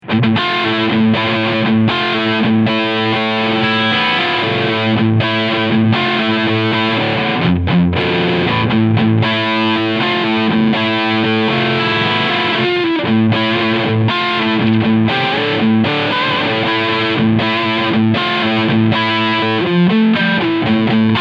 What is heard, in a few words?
Harley Benton SC 550 SL Riffsound Bridge Pickup; Tribute